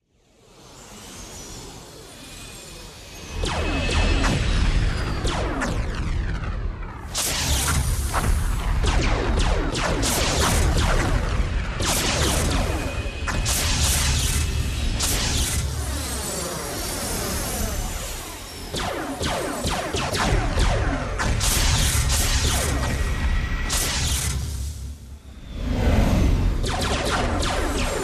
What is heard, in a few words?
Futuristic Laser Battle